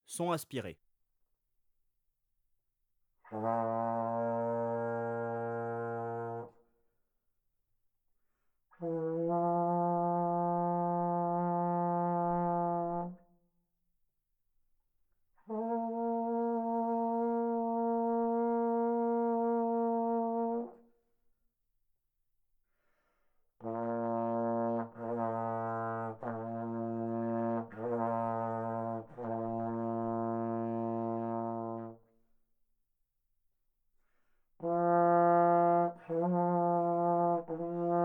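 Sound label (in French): sons aspirés, trombone; Textures; Sons sourds; traite orchestration; trombone tenor trombone basse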